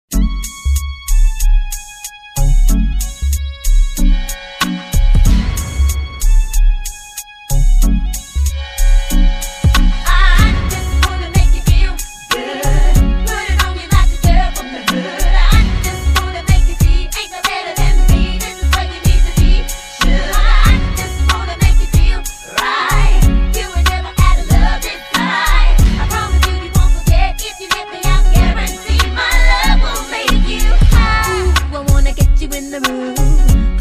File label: pop before